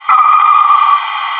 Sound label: pain; maxpack